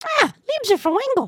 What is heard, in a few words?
excited, emotes